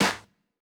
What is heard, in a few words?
Snare Hit, Bmin; Hip Hop; Hip Hop Exotica; Apple Loops; Big Fish Audio